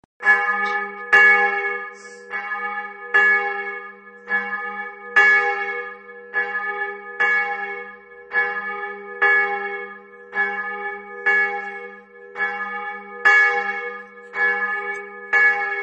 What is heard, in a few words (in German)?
glocke, kirche